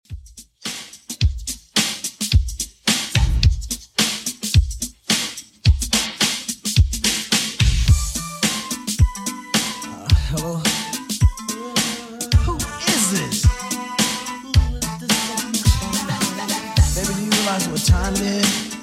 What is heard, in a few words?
80s Redrum 108bpm